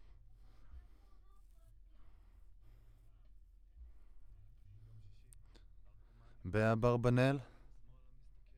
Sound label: Voc OD